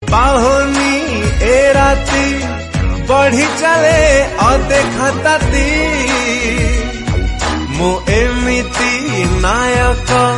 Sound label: Odia Ringtone